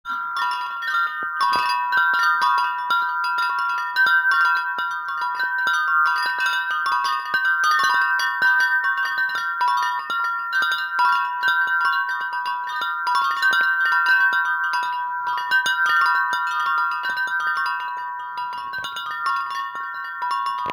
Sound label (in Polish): dzwonek duzy, fengshui; dzwonki